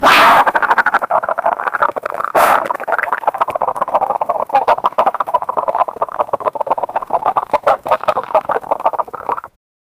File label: a super loud and obnoxiou